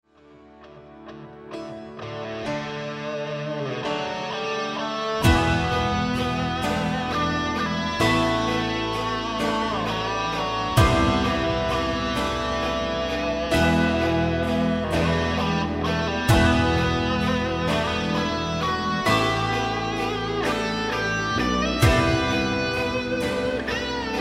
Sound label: Waltz